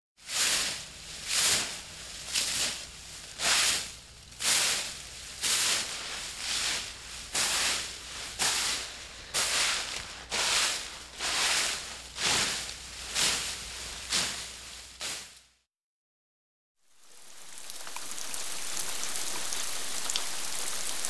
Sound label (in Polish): liscie deszcz; mlodsze przedszkolaki